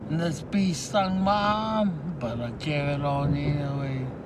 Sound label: Bee Sting